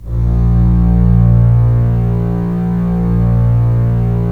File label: STR, Roland LA Composer